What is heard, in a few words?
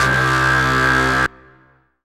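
Rave Synth, Dance Mega Jungle Rave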